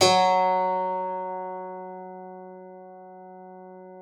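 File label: harpsichord F#3; KLAVICYMB; Xsample VOL-8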